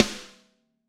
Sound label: Snare Crack